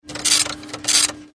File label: printer gift